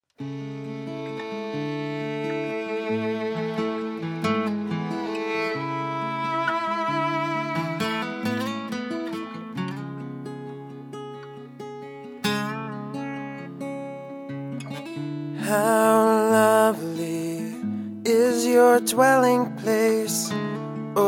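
violin with a Christian band, improvisational violin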